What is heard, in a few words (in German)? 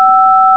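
Tonwahl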